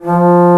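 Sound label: Trombone p F2; Digital Sound Factory Virtuoso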